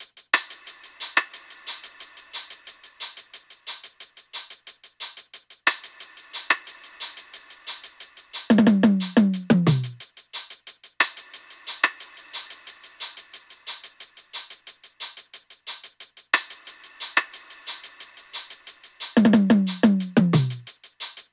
perc, Drum Loops, Top Loops, 90; SD MIDNIGHT Sample Pack; Slate

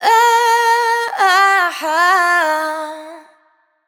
riff Quick Run 105 Eb wet, vocal; Slate Digital Omnivox Vocal Sample Pack

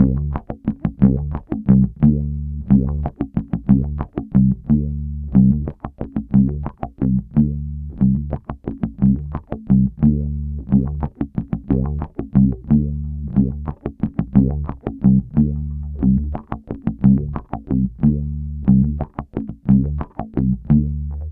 Bass loop 90 D, Electric Bass Loops Direct; Slate Digital BASSHOLE Sample Pack

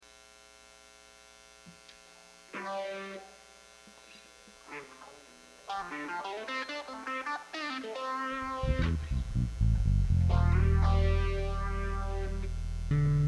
Buzz and Feedback Mix

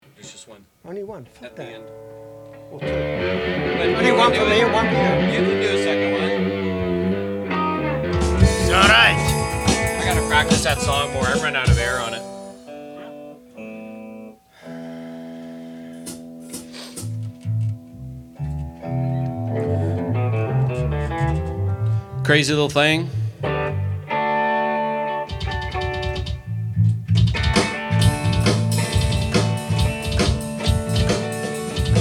Vocals and Background

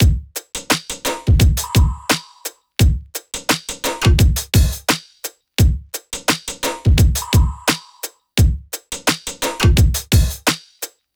drum loop 86; Pop; Drums; Full Drum Loops; wierfield; Slate; SD PASADENA Pop Sample Pack

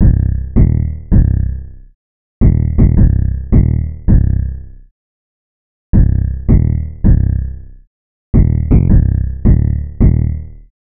808 loop 162 Cm; Slate Digital BASSHOLE Sample Pack; 808 Loops

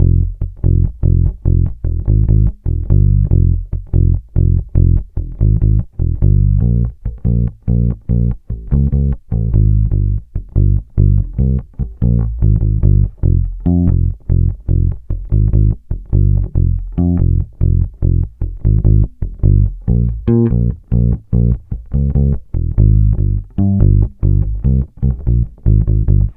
Bass loop 145 Fm, Electric Bass Loops Direct; Slate Digital BASSHOLE Sample Pack

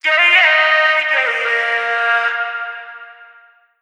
phrase Bbm, Vocal; Slate Digital Omnivox Vocal Sample Pack

